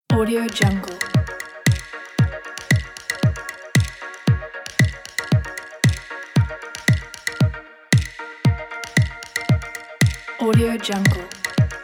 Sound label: electronic music pack; loopwaves